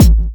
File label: Kik